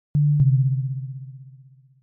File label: mode deactivated